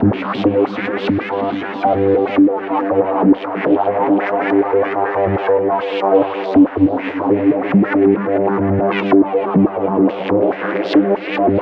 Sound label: Lead Line G 140 BPM, Psytrance; Lead Lines; Gazlife